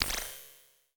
p atk uavlaser

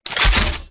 shotgun double

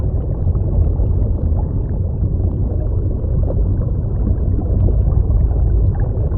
deep sea rapid

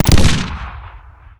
fire boom

